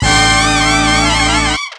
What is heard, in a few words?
Brass Patina; Mo' Phatt; Digital Sound Factory Mo' Phatt